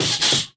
taunt bunnyhopper hop

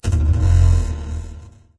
docked boat; video game

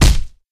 high velocity impact

